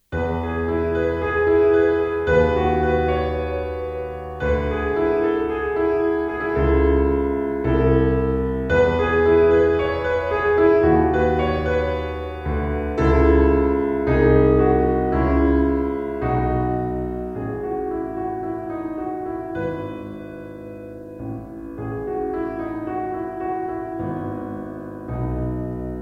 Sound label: piano pop